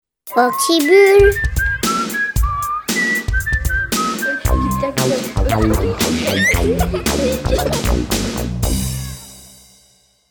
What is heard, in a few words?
jingle; oxybul